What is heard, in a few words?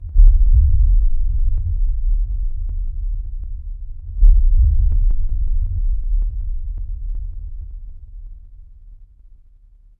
horror heartbeat